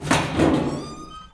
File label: brass op; Mafie 1